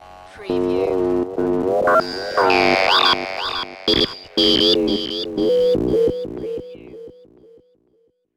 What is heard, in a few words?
Alien Transmission; sound effects bible